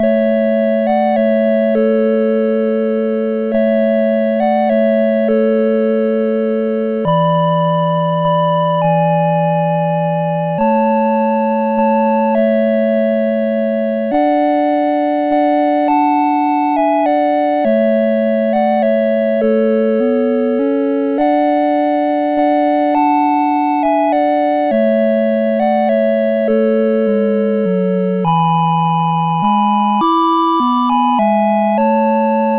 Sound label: Silent Night, CSG8580R5; sid